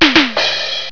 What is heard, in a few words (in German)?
TUSCH